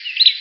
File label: song, Empidonax difficilis; Passeriformes; Tyrannidae